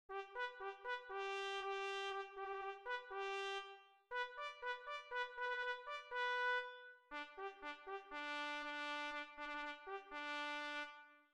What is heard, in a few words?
mess call